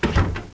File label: door; PC Gamer 34